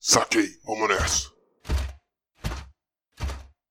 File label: Black Knight Walk; Black Knight Walk 3